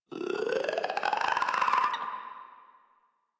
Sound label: Infested Runner Chasing; Warframe; Runners